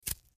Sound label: MedicalScientific; Soundfx III